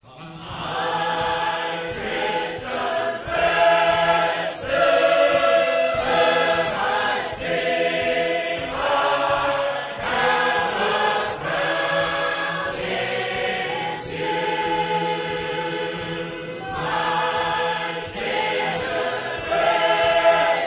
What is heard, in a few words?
harp